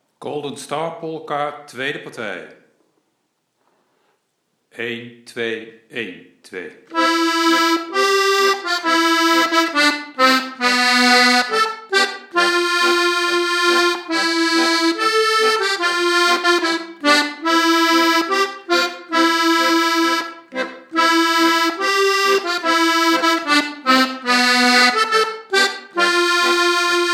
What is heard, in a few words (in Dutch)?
golden star polka tweede partij